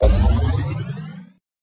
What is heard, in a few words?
multiplier up